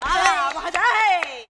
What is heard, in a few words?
VOC, Gipsy; Best Service; Ethnic Journey Sound Cube